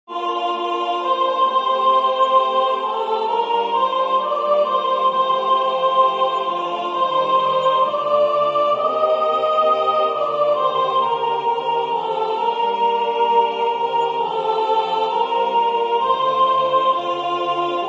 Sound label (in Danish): sopran